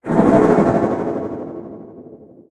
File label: cleave spin; smite; thanatos